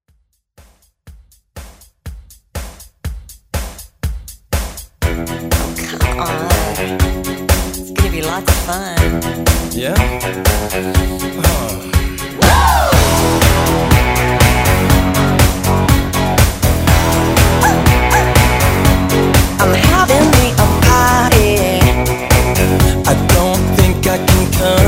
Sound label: Country Pop Redrum